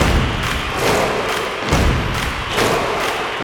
صدای استادیوم; kit drum rhythms

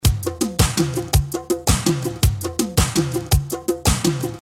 ریتم بندری

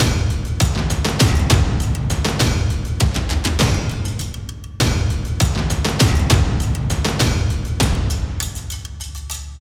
لوپ سینماتیک; kit drum rhythms

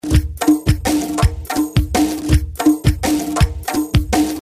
دمو ریتم های ایرانی

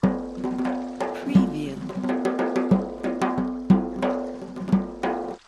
zarb, ریتم ضرب زورخانه

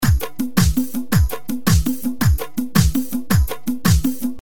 ریتم بندری; sample irani

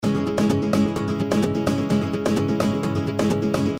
ریتم گیتار پاپ, nylon guitar rhythm

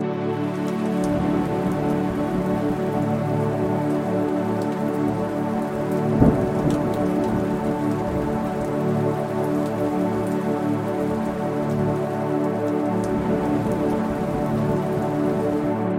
سمپل فضاسازی پد; ambient; pad; Sylva; هنر صدا; مناسب برای دکلمه، مداحی استودیویی، فضاسازی

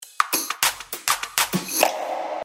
نمونه صدای پاساژ; ریتم های درام کیت; لوپ درام; پک پاساژ

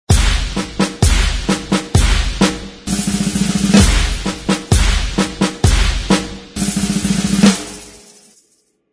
ریتم های مذهبی برای محرم

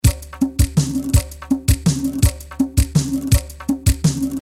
دمو ریتم های ایرانی

